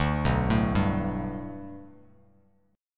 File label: B Minor 7th Sharp 5th B Bass Standard, chord; Bm7#5 arpeggiated; JGuitar